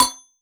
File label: normal hit whistle; argon